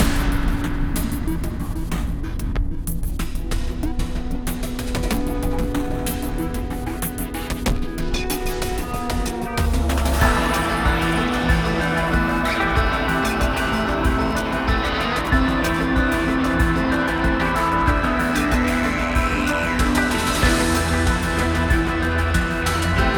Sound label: destroyed castle battle normal; Magic GameClient